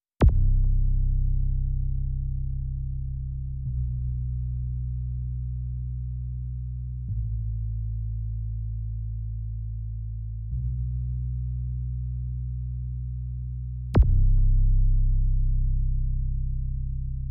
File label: Bass